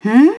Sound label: humm; BattleSNDS